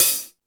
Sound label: Stadium Rox, Drum Machines